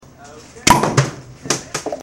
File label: ball gun; NERF; BigSNAPBall